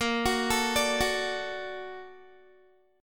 Bb Augmented Major 7th Bb down Guitar; Bb+M7; arpeggiated; 4E,4A,5D,5G,5C,6F

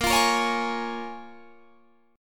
C sharp 6th A sharp

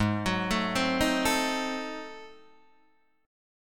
Ab 7th Flat 9th Guitar Open D, chord

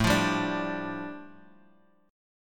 A 7th Suspended 4th Sharp 5th, A, down; A7sus4#5 strummed

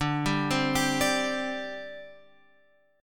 D 7th Suspended 4th D Guitar Open F; D7sus4; arpeggiated; x,9,7,7,10,9

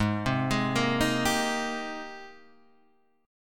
Ab Major 9th Ab, Guitar Drop D; 6,3,6,3,4,3; down

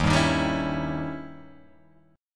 Eb 7th Flat 9th, Eb, Guitar, Open G

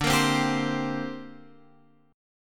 D 7th Suspended 4th Sharp 5th D

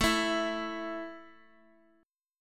A 5th A down, chord

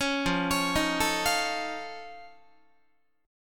F Augmented 7th F Guitar, chord; F+7 arpeggiated; 16,13,16,13,13,16; JGuitar

